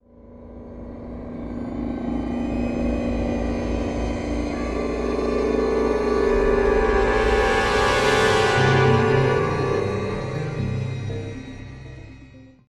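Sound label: sting, strings; transformers war for cybertron gamerip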